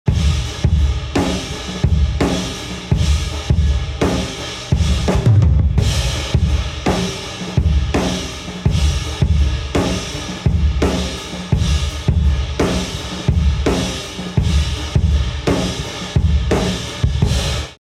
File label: drum recording, drummer, rock drummer, rock; hamburg schlagzuger; philipp kraus